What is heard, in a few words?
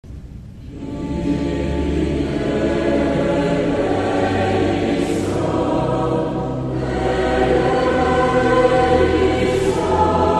Kyrie Tutti; St Jean